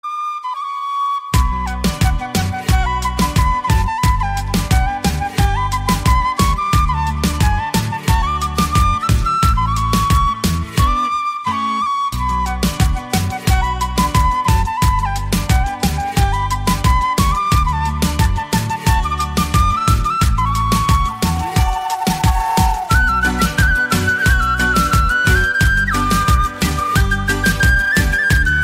flauta

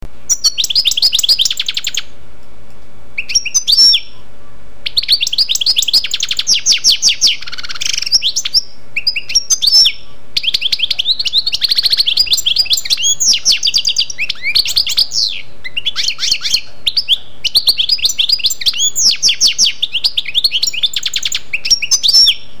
jilguero

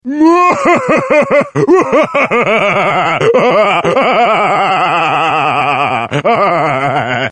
risa boba malevola; tono de llamada

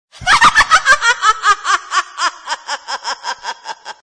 risa mujer

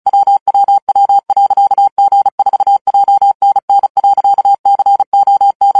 codigo morse SOS; tono de llamada

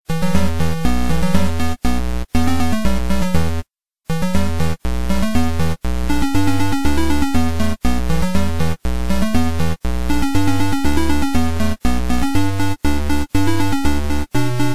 consola de 8 bits; angry birds

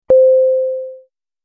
pitido de sms

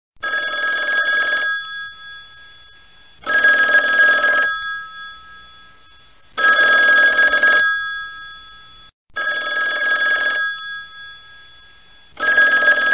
Sonido timbre Telefono Antiguo

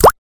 gotas de agua; Xiaomi; tono de llamada; Gotas de agua Xiaomi (9,84 KB)

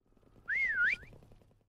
silbido; tono de llamada; COD Mobile

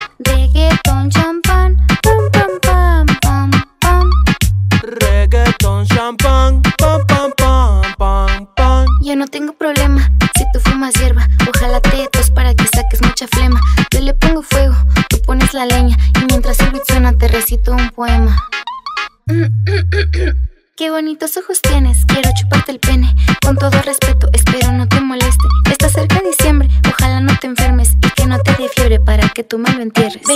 reggaeton

